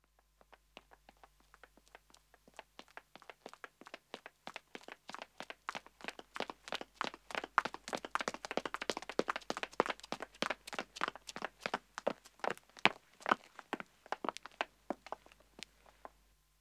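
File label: Pavement Running 1 man & 1 woman, Footsteps; BBC Sound Effects Library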